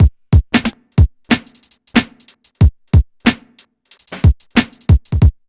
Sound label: BEAT, Hip Hop; USB Soundscan vol.34; AKAI; TIMBA